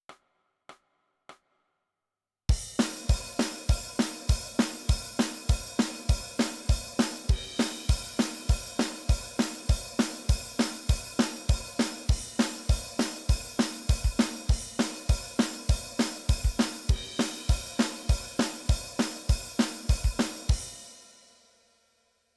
Drums

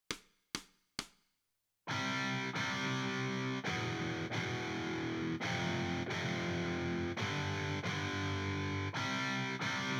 Guitar Right

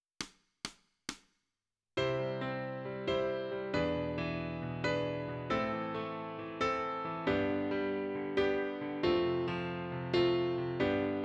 Piano

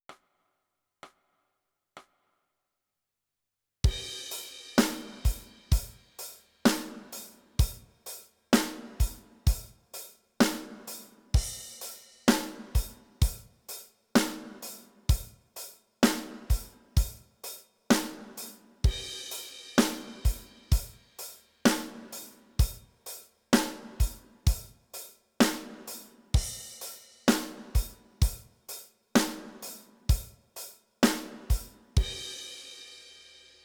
Drums

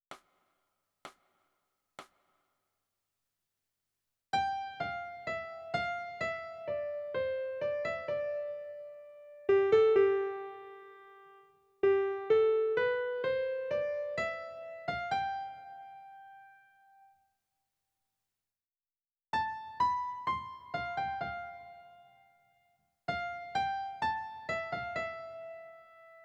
Melody

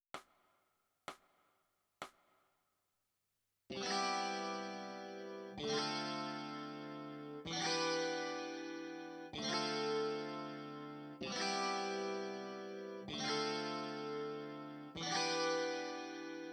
E Guitar

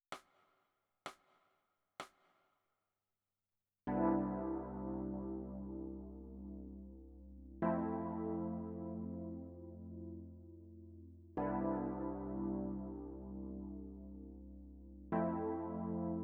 E Piano

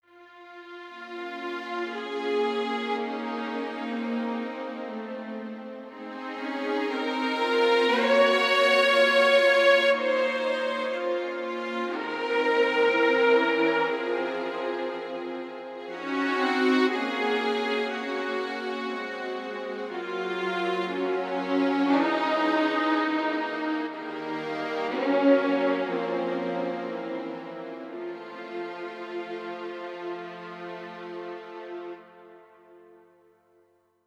3 Part; オーケストラサウンド; 『新・DTMオーケストラサウンドの作り方』

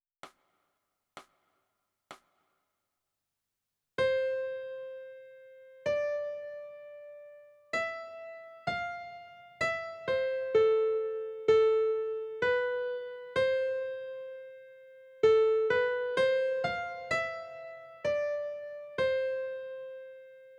Melody

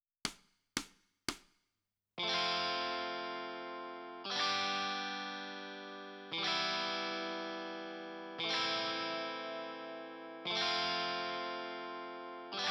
Guitar Left

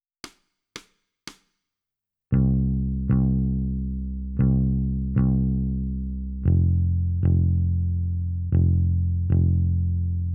Bass

